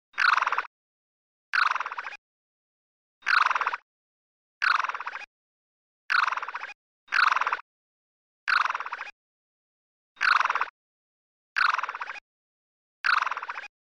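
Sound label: sandgrouse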